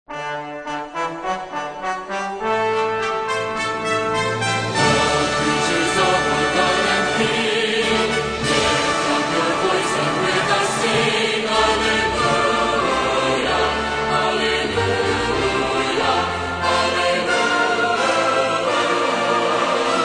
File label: Choir And Orchestra